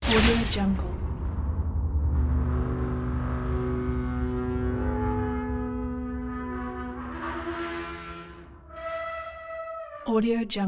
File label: searing metallic impact zappy laser blast; industrial sounds